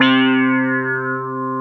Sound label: HONNER C3, Keyboards Old School; USB Soundscan vol.09; AKAI